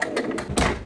Retract; WoTDemo; WoTTraps

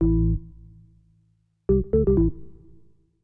organ house 125 A; eJay; Keys

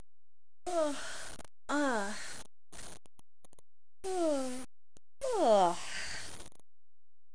yawing

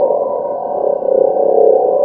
farsight; MOTS

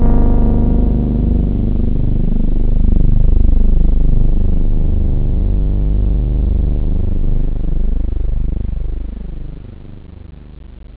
hi synth

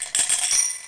Coin; BOOM

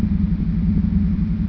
Sound machine

